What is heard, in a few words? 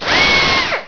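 power driver; 机器; POWRDRVR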